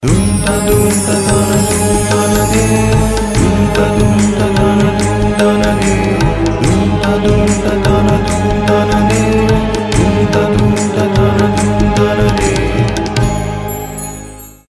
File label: Chanting